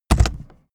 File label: aluminium case hit